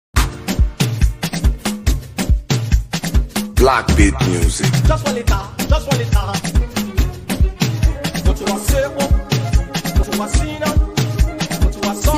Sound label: Cruise Beat